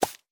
刷洗犰狳声

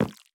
已涂蜡告示牌交互失败声

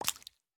青蛙伸舌声

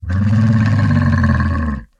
骆驼环境声

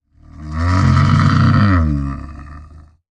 骆驼站起声

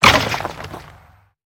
凋灵骷髅死亡声

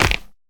嘎枝摇摆声

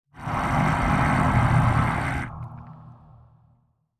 愤怒声; 监守者

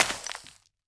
可疑的沙子破坏声

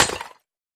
饰纹陶罐碎裂声

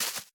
杜鹃树叶破坏声

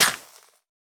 可疑的沙砾破坏声

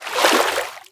水中游泳声

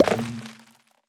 蜂箱进入声

